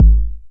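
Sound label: 909 BD tape, kick drum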